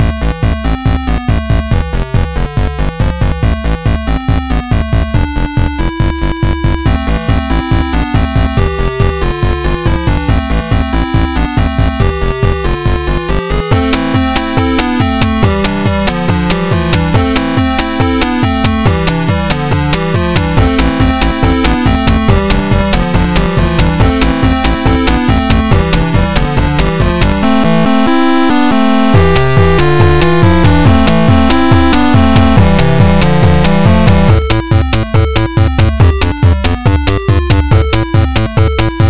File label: song